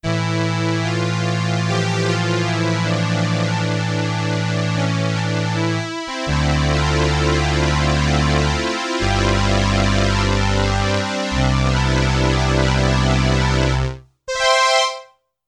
STRINGS I with vibrato & Ensemble II, Roland RS-202